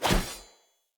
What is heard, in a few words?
Short Sword Whoosh G; Weapons; Enemy; Swords; SinecureShortSword